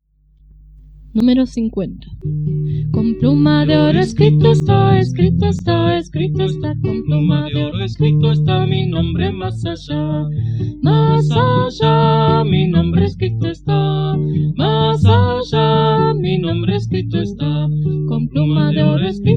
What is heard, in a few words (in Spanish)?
Coro, himnario niños